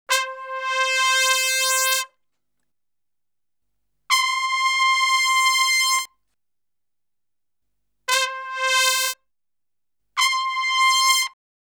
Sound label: Salsa Picante; Masterbits; World Zone VOL-3